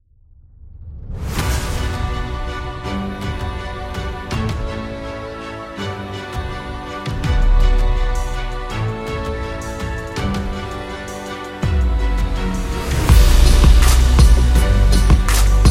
Inspirational Hip Hop

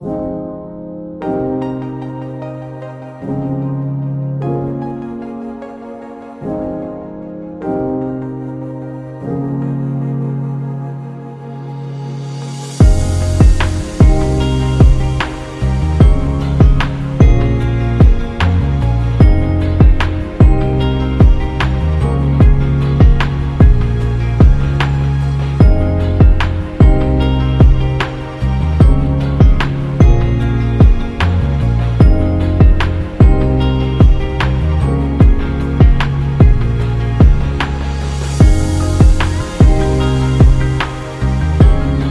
A Lofi Chill

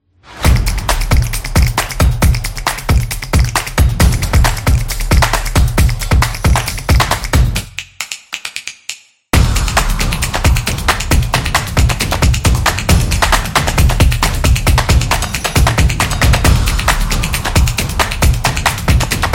Percussion Upbeat Music; event promo

